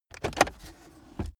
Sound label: car accessories center console drawer open fast; autos; verkehr transport